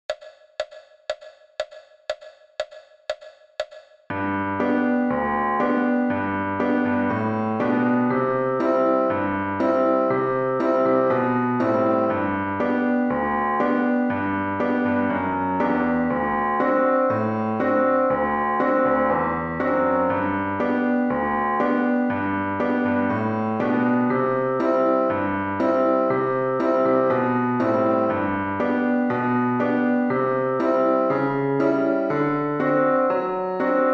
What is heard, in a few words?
piano solo